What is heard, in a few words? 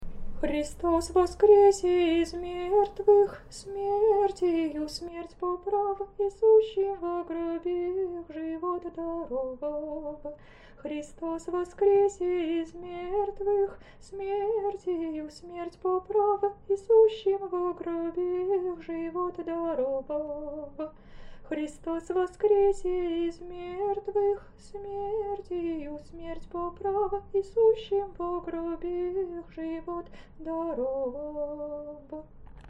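easter canon byzantine christ is risen 3x faster, singing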